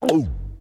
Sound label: gulp; soundspvz